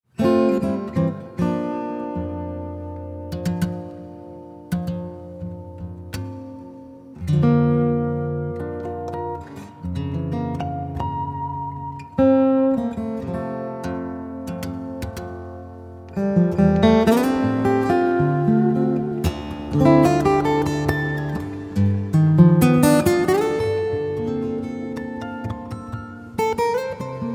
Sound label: Dadgad Cafe Hymn